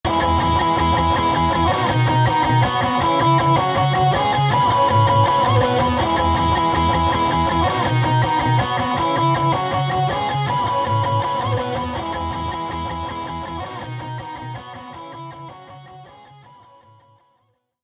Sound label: Stacked Power Punk Pop